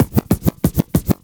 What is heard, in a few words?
Scratch; Ableton Live 12 Lite; Core Library